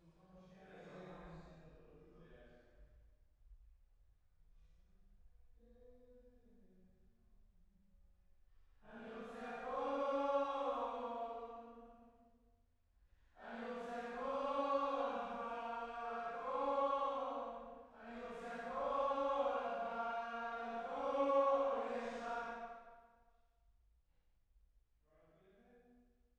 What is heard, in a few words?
Choir